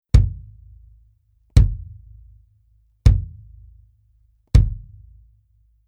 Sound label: BASS EMAD Onyx Bury Beater